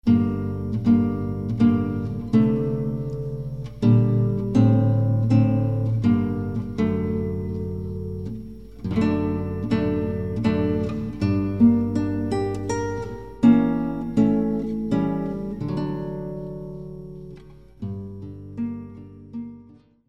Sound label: larghetto